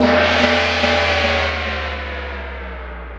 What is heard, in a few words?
Gong Bender; Sonic Foundry (Sony Creative Software) - Futurist Drum & Bass; Drums & Percussion